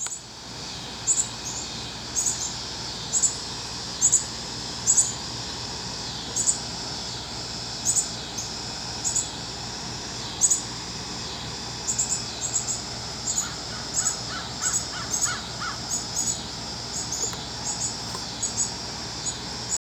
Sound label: cats love bird sounds, cardinals; wildlife; fauna